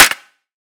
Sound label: claps, dance drum kit; 99Sounds x Monosounds; Twilight